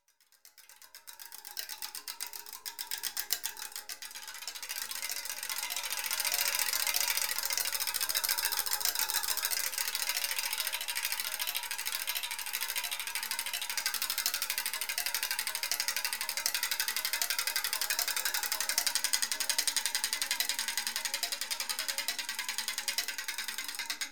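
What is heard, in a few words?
bicycle spokes crackling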